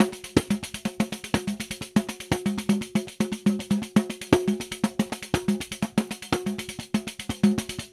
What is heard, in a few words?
RIO, Rio Batucada